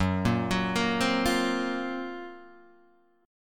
Gb Major 7th Flat 5th, down, Guitar, Half Step Down